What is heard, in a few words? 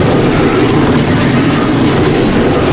grind gutter; terrains; Tony Hawk's Project 8; XBOX